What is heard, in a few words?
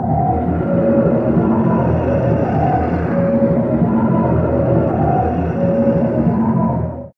skill bullet